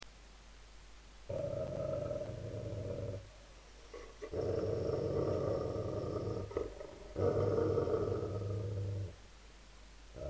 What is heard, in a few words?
food guarding growl